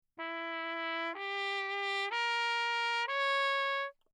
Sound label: TRP MUTE Bach; Perinétventiltrompete; Mutes